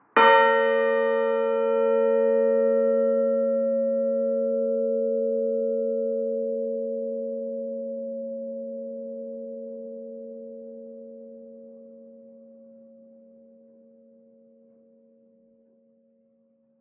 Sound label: bells, fairwarp